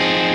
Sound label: 8 9 8 10 8 Fm7